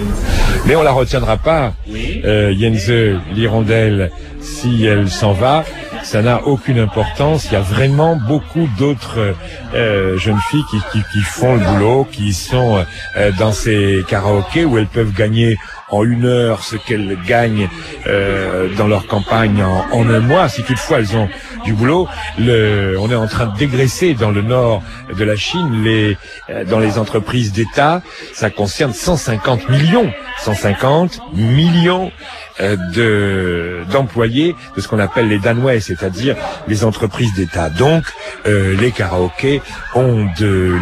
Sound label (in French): Chine karaoké